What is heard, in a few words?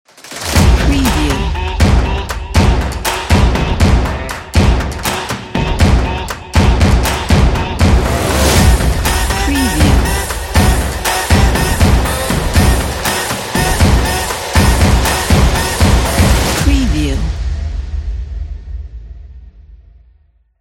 stomp chops